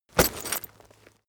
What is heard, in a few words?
leather metal impact chink